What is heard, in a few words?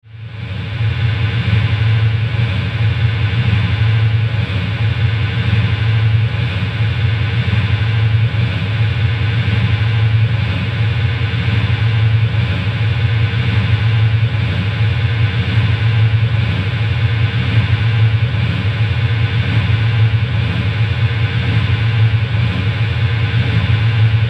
Drone Industrial Generator Pulse; Blastwave